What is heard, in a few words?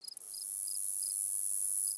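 Pets Insect Large Idle; creatures; Lego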